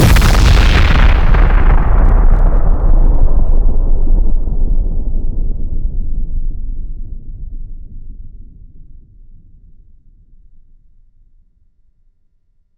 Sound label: Big nuclear explosion; Hovl Studio; SFX Battle sounds; WhiteMan Unity2D